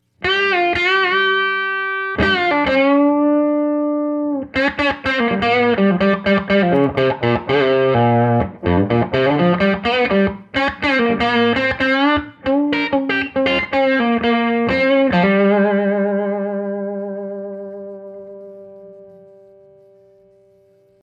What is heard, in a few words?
Solo